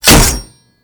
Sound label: Berserker Barrage Hit Metal E; Warframe; Warframes